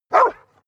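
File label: bark single, dogs; natur-tiere; hunde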